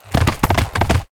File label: Ram Mount Attack Underlay